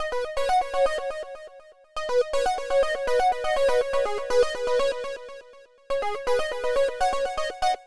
SWINGING SEQUENCE

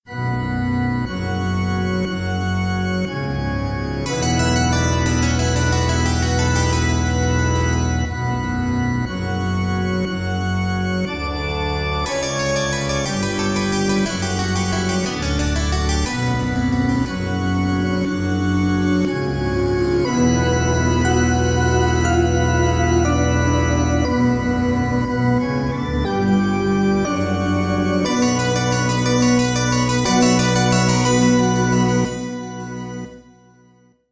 cemetery 8bit theme

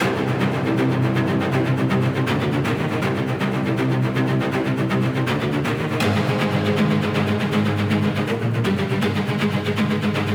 determined pursuit loop

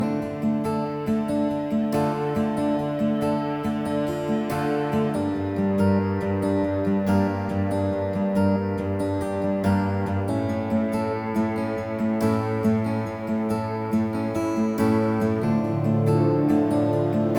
village life was hard, Looping

